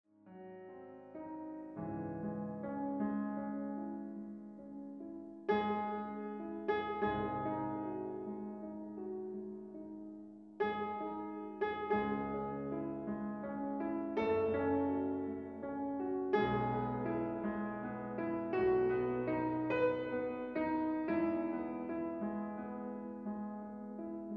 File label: Piano Moonlight Sonata; Konzerthaus